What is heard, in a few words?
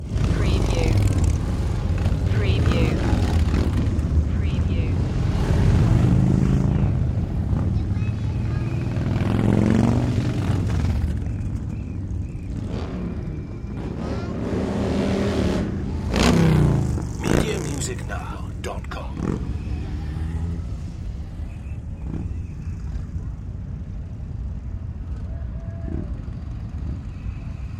Rolling Thunder